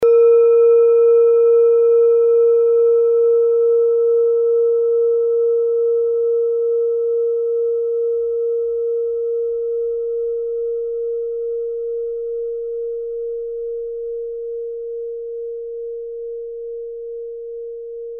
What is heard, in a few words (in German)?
kleine klangschale, Nepal; kleine klangschale nr 31 nepal planetentonschale mondknotenumlauf